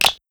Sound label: Click